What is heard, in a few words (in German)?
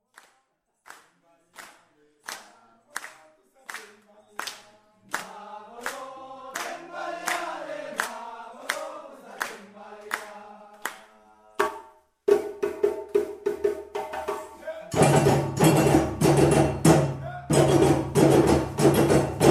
Hörprobe Djembeworkshop